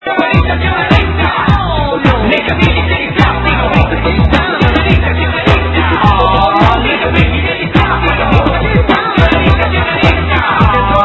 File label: rap end